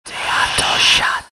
hallow, ambient; Garry's Mod; tf2

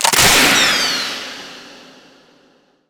powerup pickup; Garry's Mod; tf2

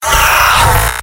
pain sharp; tf2; garrysmod; mvm

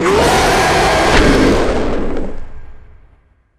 jumpscare; critters; nightmare criters

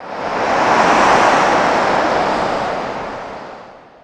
windgust, ambient; Garry's Mod; tf2

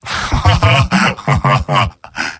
m laugh evil; tf2; garrysmod; mvm

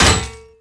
cleaver hit world; tf2

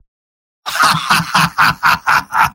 laugh happy; spy; mvm

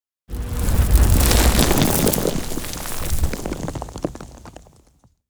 debris; tf2

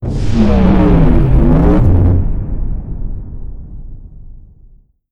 giant heavy entrance; Garry's Mod; tf2; mvm

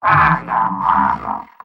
pyro negative vocalization; tf2; mvm

